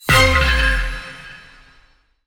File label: Coin String; godsoffortune